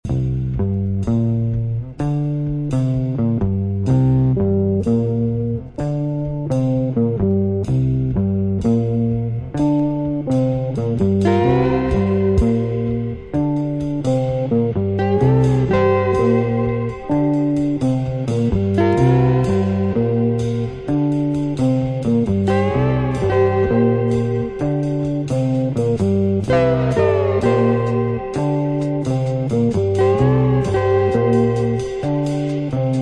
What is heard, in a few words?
soul guitar